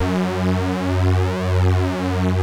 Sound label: PULZE FLANG, LEADS; Masterbits; Vintage Voltage